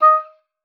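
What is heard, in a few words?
D#5, oboe d'amore; Xsample VOL-7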